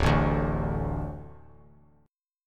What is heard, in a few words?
D 5th D, down; D5 strummed; JGuitar